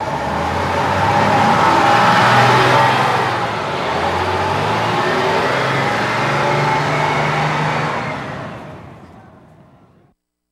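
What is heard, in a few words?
City Bus Pull Away Air Brakes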